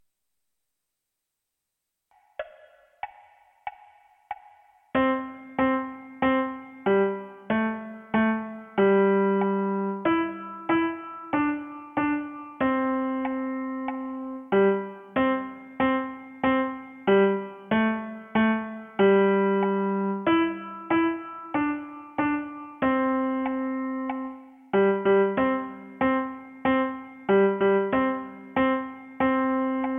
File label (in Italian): piano unite